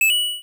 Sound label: took gold; Indiara And The Skull Gold